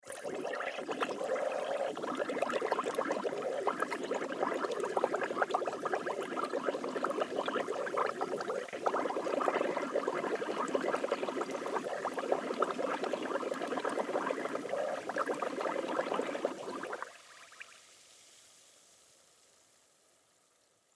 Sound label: Bubbles Air Tank, Water Bubbles Air Tank Leak Submerged; Breathing; Human; Blastwave